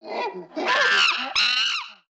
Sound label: monkey; ambience; avant gardens